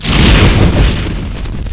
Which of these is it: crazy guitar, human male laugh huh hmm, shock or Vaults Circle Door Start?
shock